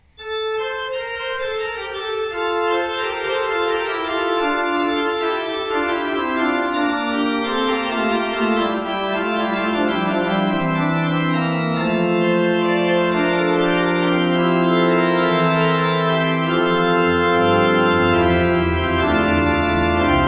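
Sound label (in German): Kleuker 15 II P, pfeifenorgeln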